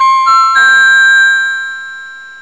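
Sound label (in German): Tür Gong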